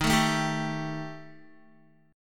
D sharp Major Flat 5th down Guitar; D#Mb5 strummed; 4F,4A,5C,5G,5C,6E; JGuitar

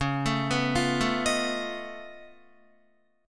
Db Augmented 9th Db down Guitar; arpeggiated; 4E,4A,5D,5G,5C,6F

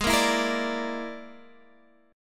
E 7th Flat 5th, Ab

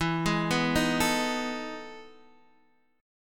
E Major 11th E down Guitar Standard; EM11 arpeggiated; JGuitar

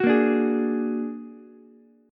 Bb Augmented 7th Bb down Ukulele; Bb+7 strummed; JGuitar

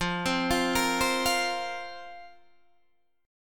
F Suspended 4th F, Guitar Standard; Fsus4 arpeggiated; 13,15,15,15,13,13; JGuitar

